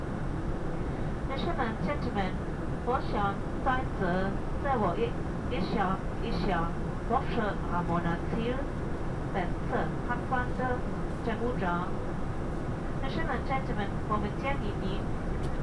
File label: Inside Aircraft Speech binaural, Background noise